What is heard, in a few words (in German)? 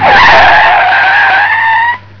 Bremsen